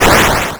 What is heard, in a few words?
portal open